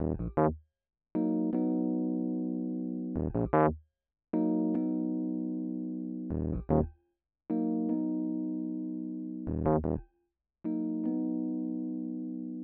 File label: Classic Electric Piano